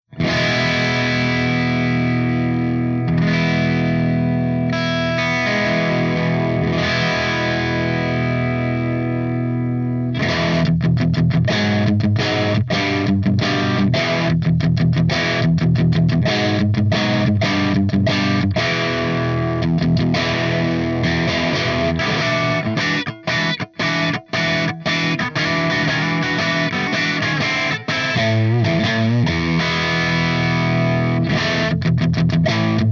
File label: BLUEBALL V30 P90